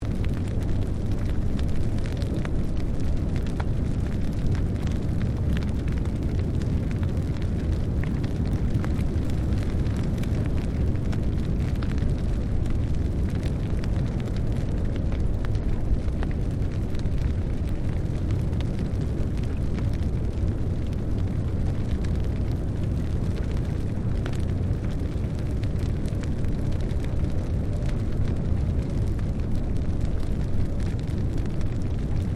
Fire Burning Off Large Established Distant Loop, controlled fire burn; Flames; Objects